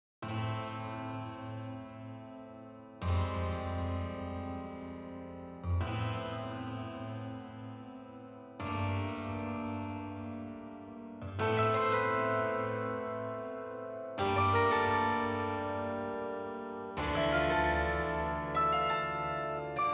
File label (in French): pianos seuls nappes seules; pour virgules